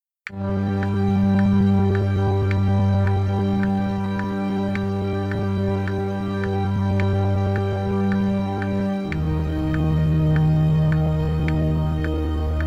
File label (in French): Instrumental Sol mineur avec clic